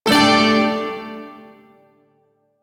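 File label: Shawzin Pentatonic Major Chord; DE; Warframe; Duviri Shawzin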